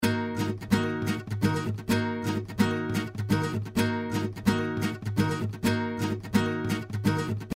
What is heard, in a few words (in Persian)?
ریتم گیتار پاپ, nylon guitar rhythm